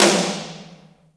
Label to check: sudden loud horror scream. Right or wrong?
Wrong